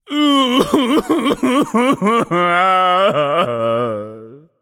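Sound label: non verbal boohoo, voice; cernunnos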